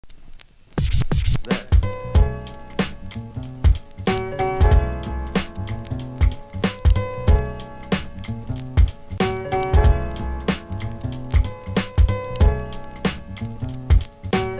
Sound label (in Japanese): piano